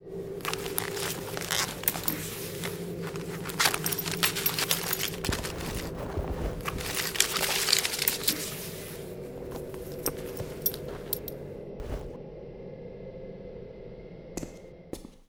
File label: String; yesterday origins gamerip 2016 switch ps4 pc ios macos